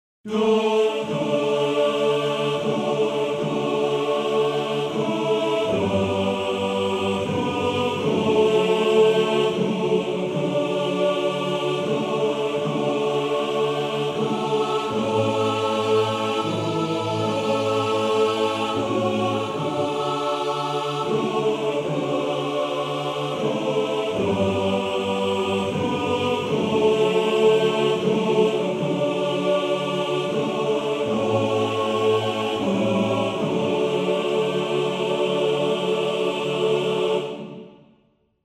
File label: Chor